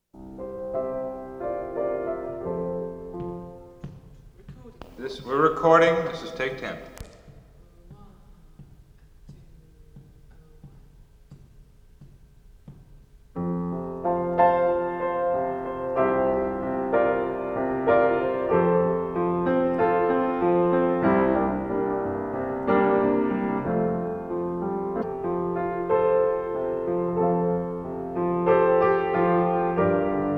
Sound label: Instrumental